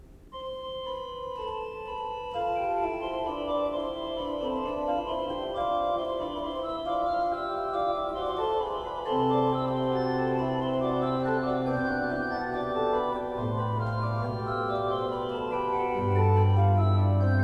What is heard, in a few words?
Variation, ORGAN; BACH; BACH ORGAN WORKS; BWV 769; Canonic Variations on -Vom Himmel hoch